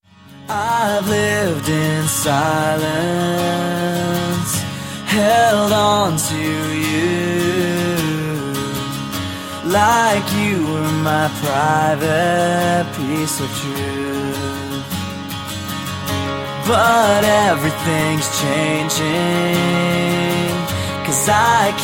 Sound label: angels in chorus